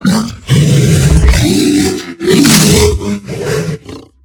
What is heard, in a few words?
bear attack power standing; skyrim